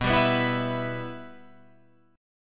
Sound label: B Suspended 4th Sharp 5th B Guitar Drop D